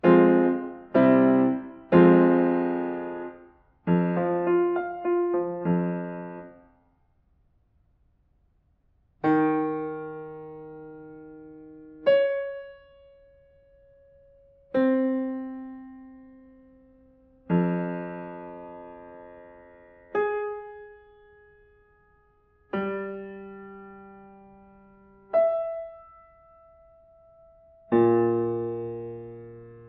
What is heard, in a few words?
diatonic collections